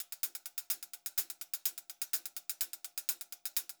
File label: Pop-Rock Drumloops; USB Soundscan vol.37; AKAI